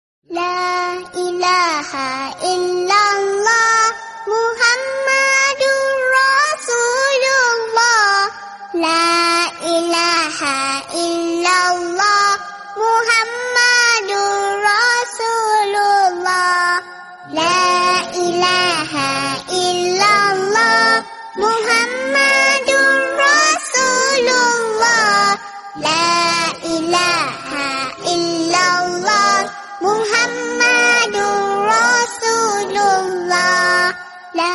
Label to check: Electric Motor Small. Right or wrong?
Wrong